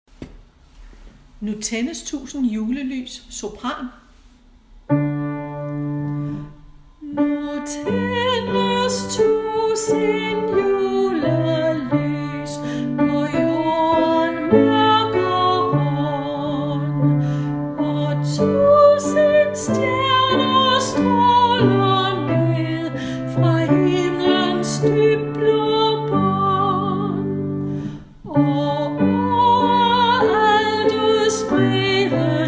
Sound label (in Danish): Nu taendes tusind julelys - sopran